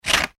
scissors cut paper sugar single; Scissors Cutting Sugar Paper ( Single Snip ); Scissors (Sound Effects:Offices); McKinney Sound

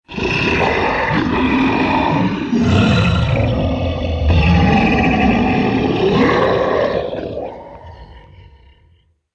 Dinosaur Horde; animal; Dinosaur Sound Effects

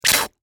Ketchup Bottle, Squirt, Empty, Suction; Household; Food; BlastWave

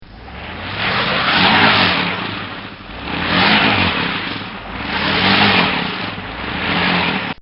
Car Stuck On Ice; Vehicles; Bobby Cole

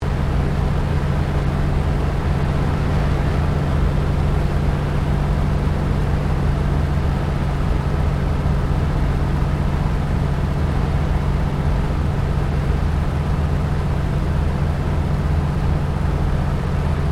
AIRCRAFT CA-13 BOOMERANG LANDING INTERIOR; Airplanes (Sound Effects:Vehicles)

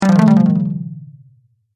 Falling Fail Slow, video game sound effects

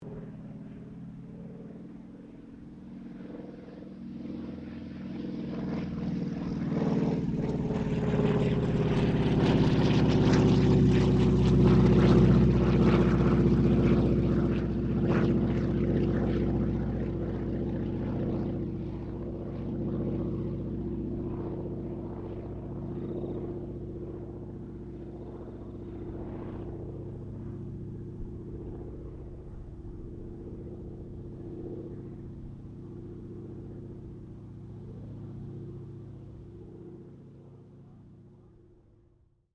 AIRCRAFT T-28D TROJAN PASS; Airplanes (Sound Effects:Vehicles)

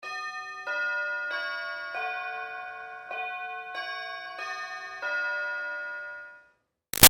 Doorbell Electronic Bell Chimes Classic; Classic Melody; Miscellaneous Doors

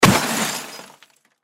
Impact Crash Debris Hit Plastic Glass; Crashes (Sound Effects:Objects); Blastwave

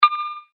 multimedia rollover, Multimedia Rollover Tone

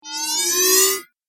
Rising Laser Tone; Laser Sound; Sci-Fi Laser

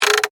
Lever Crank Short; Foley; Hand Tool; Tools; BlastWave

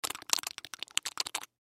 Cheeks Smack Wet; Mouth; Human; Blastwave

